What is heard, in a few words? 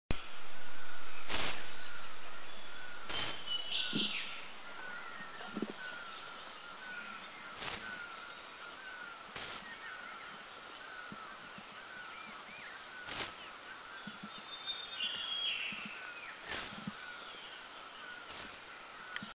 Pygmy Wren Babbler; Eaglenest; India